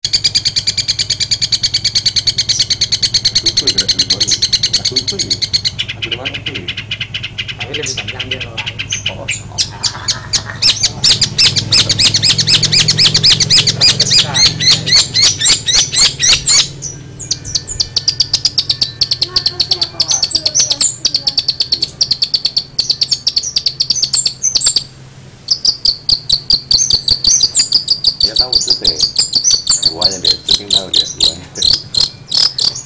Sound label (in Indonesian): cendet ditambah lovebird dan gereja